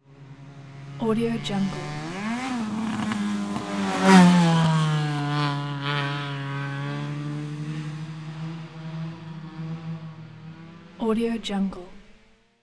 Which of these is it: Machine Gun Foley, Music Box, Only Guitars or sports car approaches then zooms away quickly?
sports car approaches then zooms away quickly